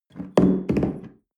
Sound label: espresso upset tablecloth medium; sport-freizeit; restaurant-cafe